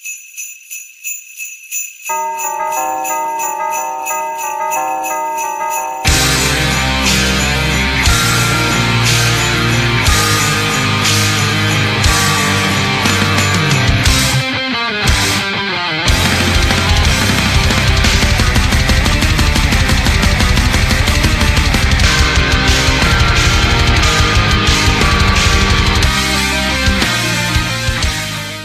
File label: carol of the bells metal